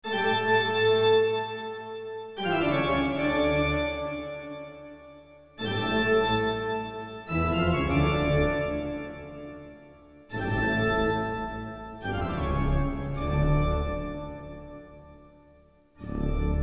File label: Toccata & Fugue In D; Bach; Yamaha; Soundfont